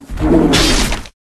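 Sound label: Ice Bear buff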